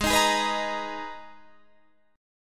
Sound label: G sharp Minor Add 9th G sharp; madd9:1,b3,(5),9; 16,18,18,16,16,18